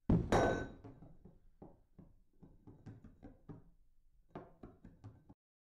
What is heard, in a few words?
robbery glass